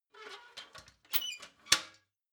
ladder metal handling fold

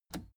plastic button scratch smooth push disable

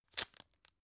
tissue open hard

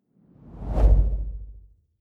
whoosh impact soft wobble bass

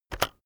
keyboard external enter press very fast heavy

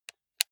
console controller playstation press slow

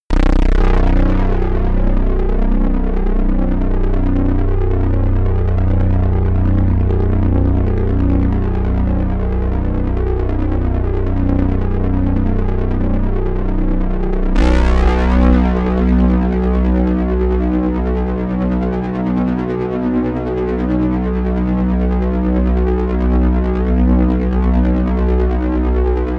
aggro bass

